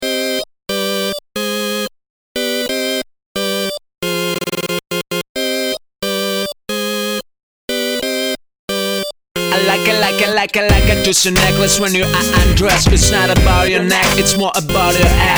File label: rap